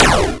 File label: lasers